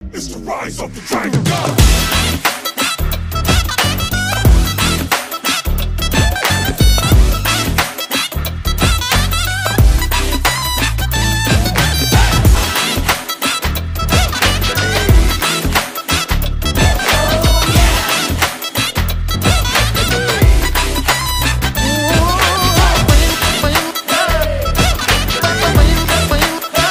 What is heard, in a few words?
ringtone